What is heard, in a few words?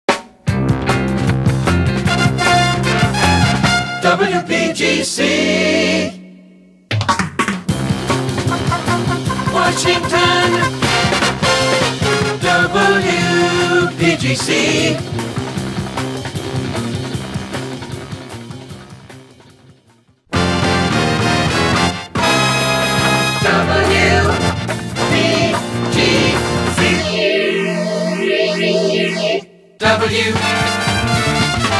jingles audition cuts; pams